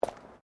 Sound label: FOOTSTEPS WOMENS LOW HEELS CONCRETE STEP; Footstep Foley; Footsteps (Sound Effects:Human)